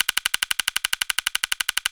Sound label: counter loop; Magic Stars 5; Wazdan